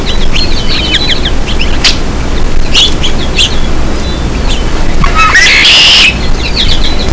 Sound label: red wing song, Red-winged Blackbird